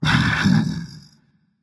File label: agree berserker; cuchulainn